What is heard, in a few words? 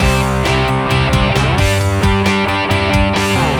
neopunk, ringtones; Google; NewWave Labs